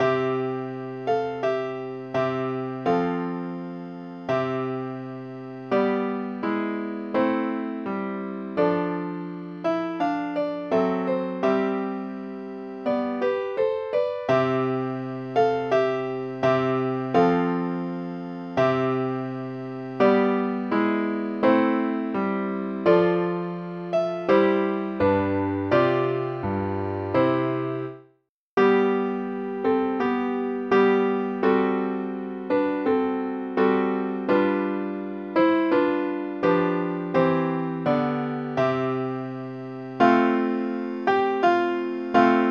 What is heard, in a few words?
st anthonys chorale, cello ensemble